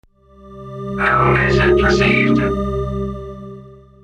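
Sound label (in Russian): кибернетическое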